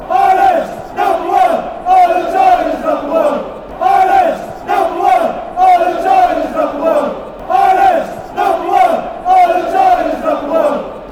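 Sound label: song, football chants; david forde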